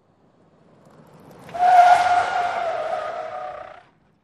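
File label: SUV Truck 180 Skid Mix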